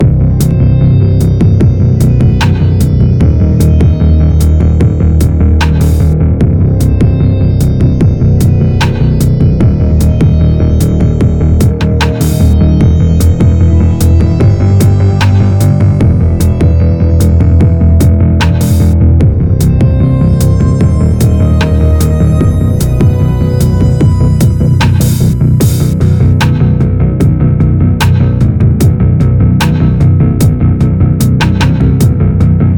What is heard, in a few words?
Tense; Everspace 2